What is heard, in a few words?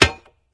axe ground; smite; chaac